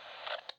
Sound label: Moto ATIS (Hangup); PTT Sounds